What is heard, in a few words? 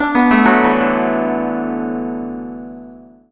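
guzheng; Spectrasonics; Heart of Asia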